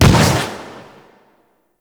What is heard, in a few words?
Arcane Melee Shockwave Sphere Explosion; Warframe; Mods; Proc